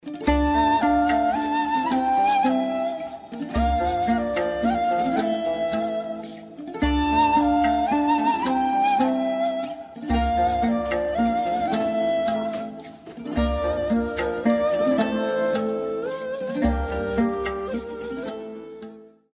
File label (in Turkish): santral fon muzikleri